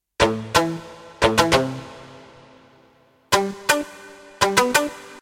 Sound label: Short Frog; Kawai K1; Bank 2 card A